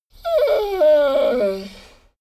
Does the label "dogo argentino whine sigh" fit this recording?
Yes